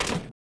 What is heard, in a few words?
fs metal, droids robots